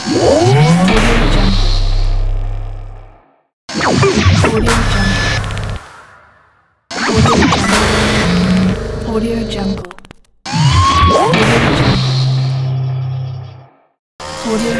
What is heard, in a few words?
pack scifi charging beam laser gun